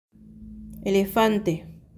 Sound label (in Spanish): elefante